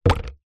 household small plastic container with water in down of surface